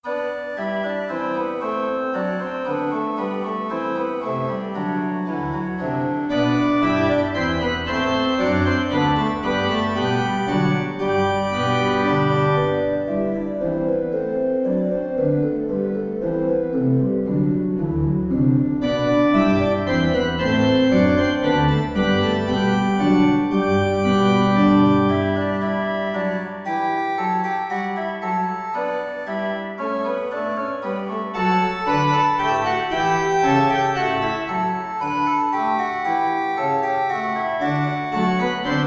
Organ Miniature Minuet II in G Major

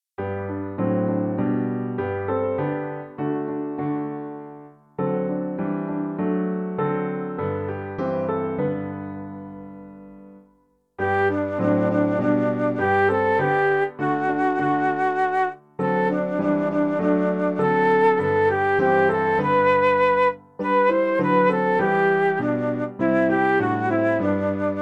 Piano and Flute

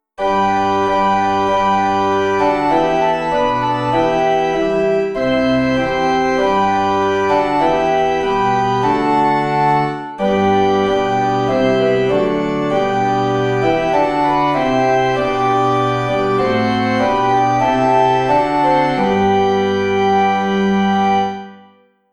Organ

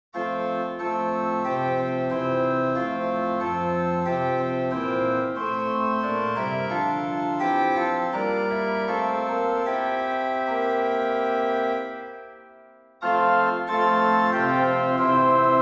Organ

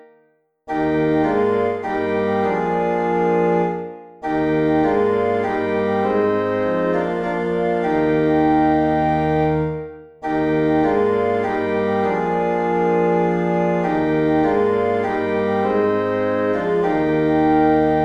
Organ; Be still my soul; Finlandia; 3 Verses

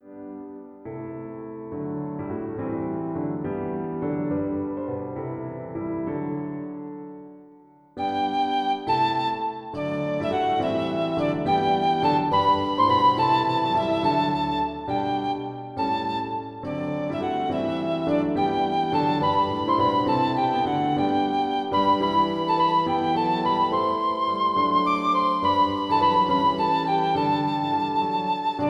Piano Flute

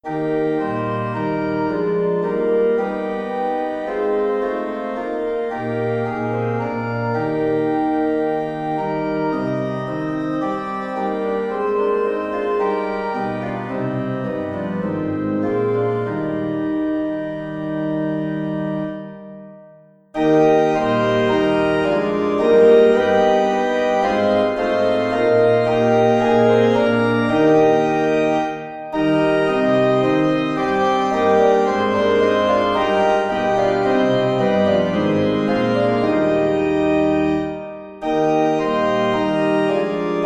Organ

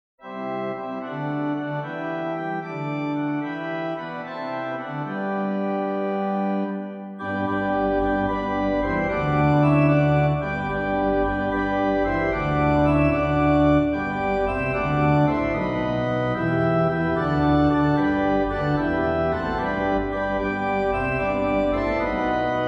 Organ, hymns without words

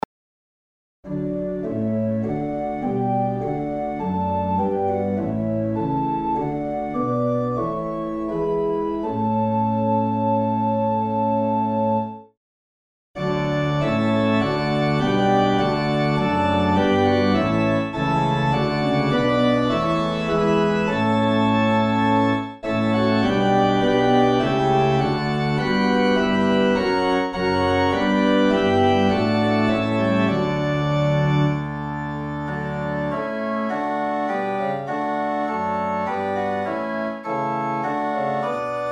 Organ

